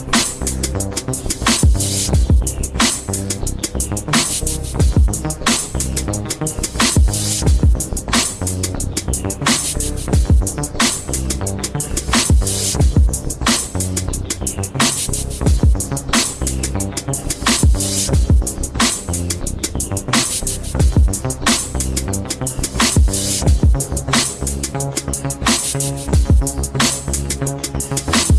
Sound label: base tone ringtone